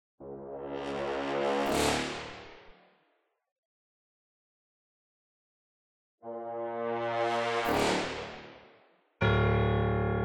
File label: Pre Start Music; Kolbeinn